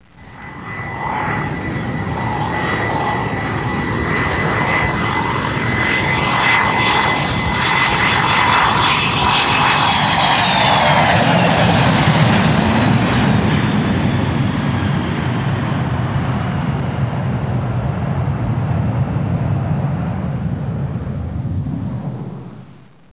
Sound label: JET